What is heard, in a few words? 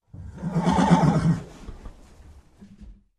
Horse Bray